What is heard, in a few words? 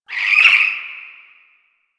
Owl Idle Chirp; Warframe; Duviri; Glyph Puzzle